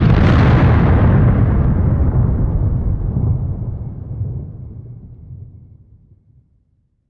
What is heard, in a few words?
Explosion Large